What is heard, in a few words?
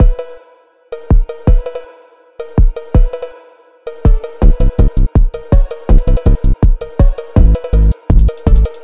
phonk; BakersAdventure